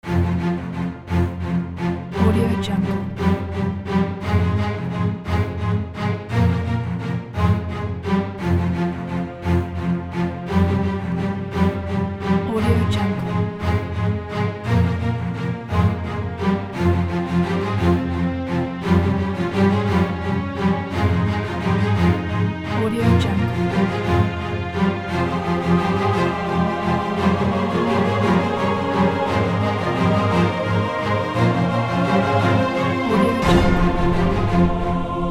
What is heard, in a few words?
short action battle